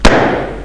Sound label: gun bang; Mr. Wonder's Greatest Toyshop on Earth